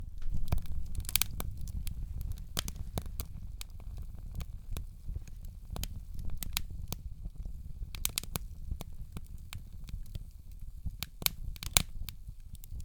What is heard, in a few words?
Stove Flame